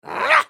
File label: effort; Ziggs